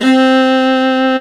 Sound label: C3 VIOLIN; roland1